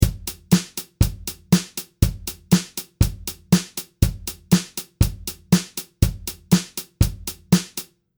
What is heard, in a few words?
8 beat midi